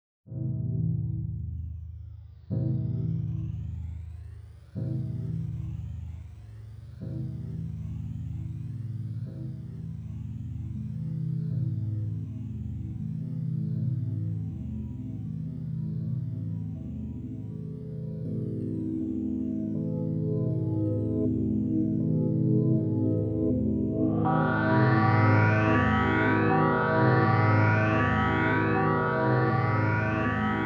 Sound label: Rainsteps, 432 Hz